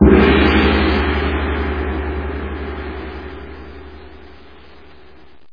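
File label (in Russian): гонг одиночный глубокий долгий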